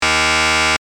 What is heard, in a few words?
buzzer; effect; alarm